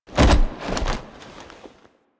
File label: Queens Lair Operator Body Roll; Warframe; The War Within